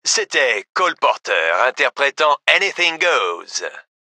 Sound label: music